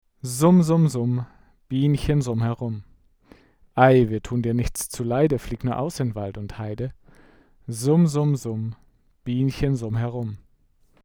Cardioid Vocals; DPA 4488

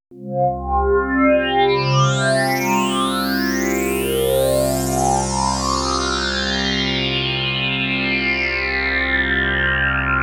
Synthesizer Sweep

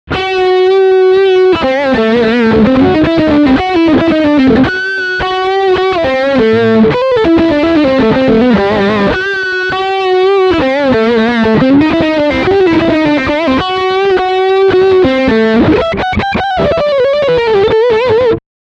Lick Front pickup

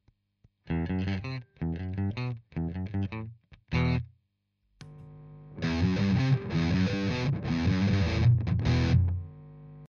Bridge Sustain

